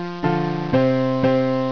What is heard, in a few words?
Sea Cliff Maximize; mer